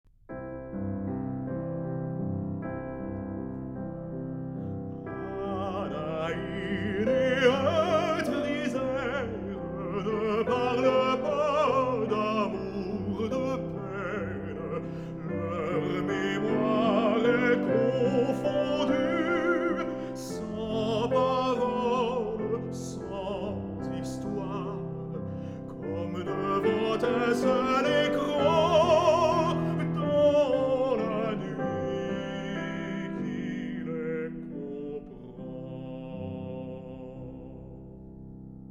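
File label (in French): orgue de barbarie; sans coup ferir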